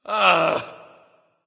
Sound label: "ugh"; smite; voicepack; ullr